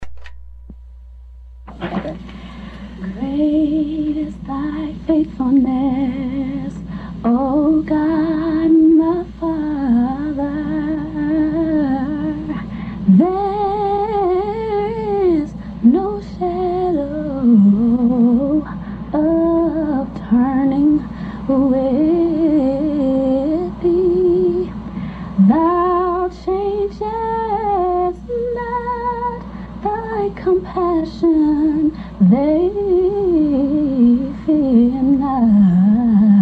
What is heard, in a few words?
gospel medley acapella live